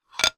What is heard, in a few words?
household knife movement on plate